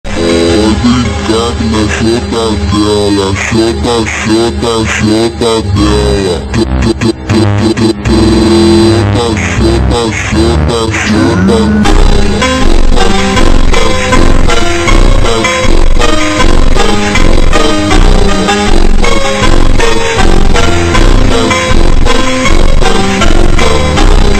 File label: slowed and reverb